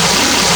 water swing loop

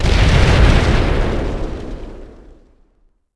Bomb Four Explosion; Civ4 warlords

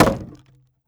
wood; Rust

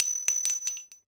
grenade launcher casing stone

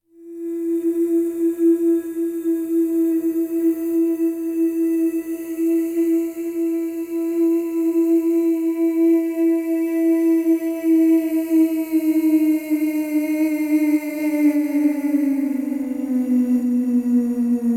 Slow Fall, War Music; Civ5

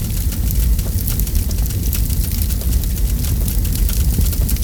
Amb Destruction; Red Orchestra Ostfront 41-45

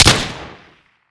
pp2000; Modern Warfare

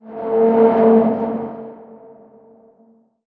explosive objects; World of Tanks

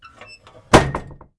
Vehicle Hatches; Red Orchestra 41-45

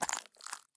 walk glass; Escape from Tarkov

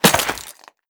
foot glass dirt, footsteps; Call of Duty Modern Warfare

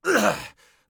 hit; Escape from Tarkov

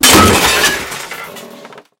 streetlight crush

